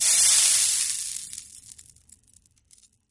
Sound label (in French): cuisson